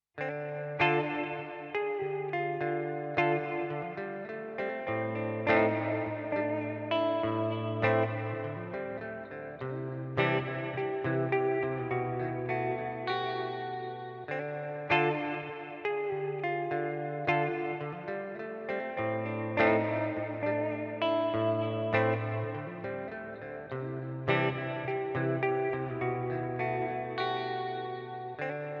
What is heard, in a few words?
Plate Reverb GFI Systems Specular Tempus